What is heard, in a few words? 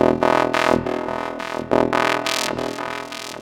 synths